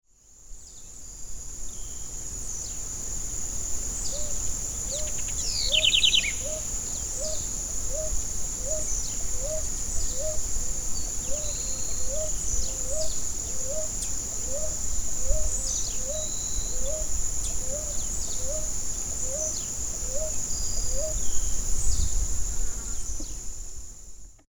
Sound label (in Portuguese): Columbina talpacoti Rolinha